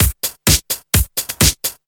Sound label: Straight Loop 128 BPM, House